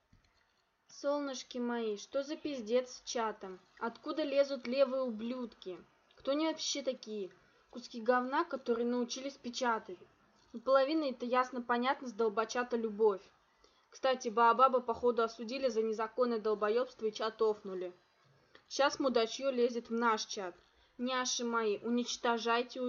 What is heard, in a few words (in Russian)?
krik